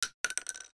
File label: cart sg